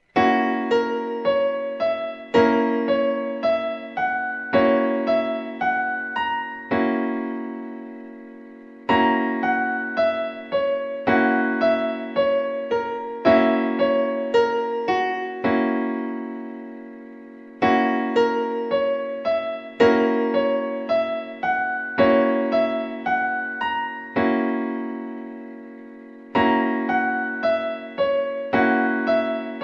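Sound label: F sharp 7 Gb7 Piano Chord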